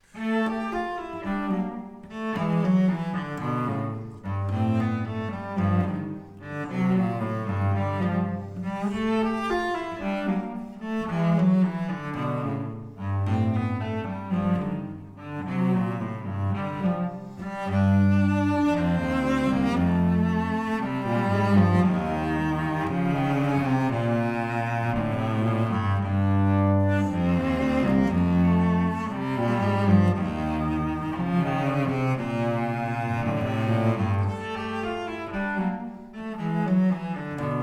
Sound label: cello duo